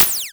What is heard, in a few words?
close, Interface Sounds; Kenney's Sound Pack